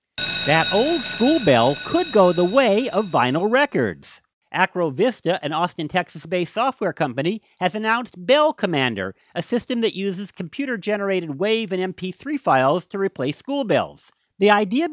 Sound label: schoolbells